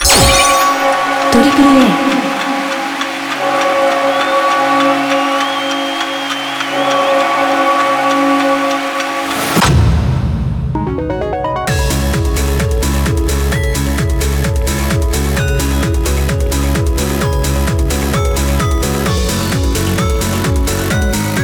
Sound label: applause